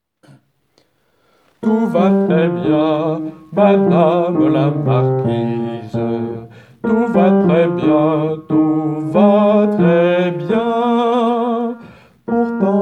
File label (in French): Hommes